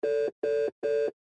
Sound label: outgoing no answer, phone